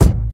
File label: raw kick a key; kick drum samples; drum single hits one shots